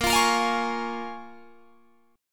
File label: Bb Minor 7th, Guitar, Drop D